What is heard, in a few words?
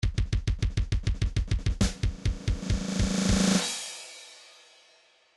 trance midi loops fills march; Newtronic Trance Constuction Kit